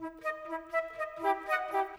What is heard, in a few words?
Winds, Orchestral; Sonic Foundry (Sony Creative Software); Orchestral VOL-2; Modern 20